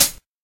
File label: soft sounding closed high hat sound D key; hat samples